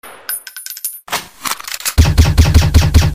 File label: reloading silenced gun shots